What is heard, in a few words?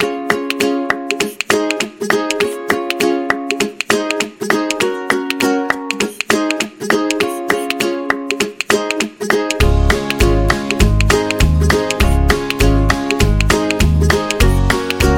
Kids Loop; cooking show